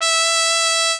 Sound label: mexican trumpet